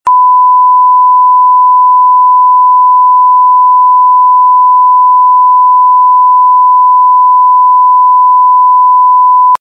1kHz 0dB; Samsung Galaxy Mini

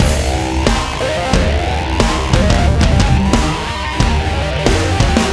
ROCK TONE, ringtones; Google; NewWave Labs

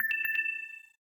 Low Battery; LG; G3-Stylus

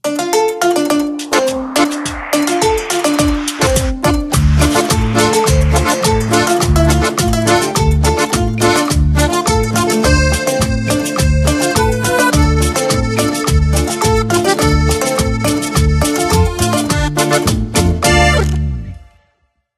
Cumbia Chill; Microsoft Lumia-950-XL; Kolbi ICE

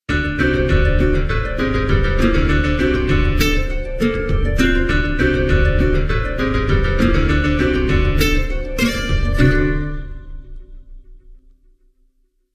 Ringtone, BlackBerry 9000 Bold

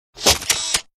Camera, ringtones; Sony Ericsson; K850i

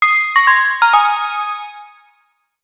Alert; PlusFourSix; Patti; Lars Edvall

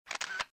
camera shutter; Sony Ericsson Z800i

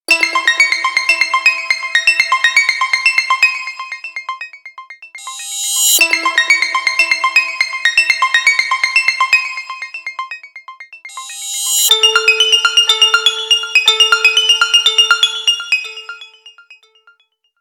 Urgent, ringtones; Motorola MOTOLUXE-XT615

